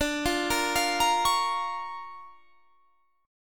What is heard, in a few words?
D Minor Major 7th Sharp 5th, D